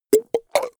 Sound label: goblin wet burp choke; koerpergeraeusche; comedy-cartoon; soundpool